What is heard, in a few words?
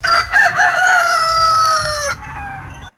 loud close up rooster crow; birds